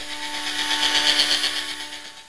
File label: drill